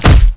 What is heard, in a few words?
hit; watro